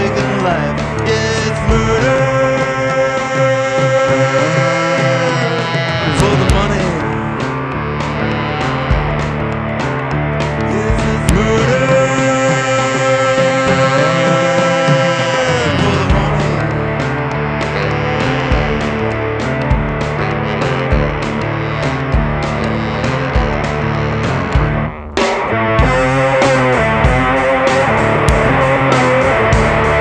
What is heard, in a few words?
rock